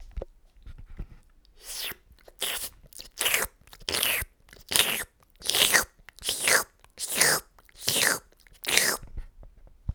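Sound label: licking sound; Смешные и комичные звуковые эффекты для детских видео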